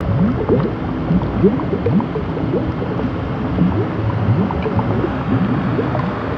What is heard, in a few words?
Bubble Machine, ambience; Maelstrom; nexus tower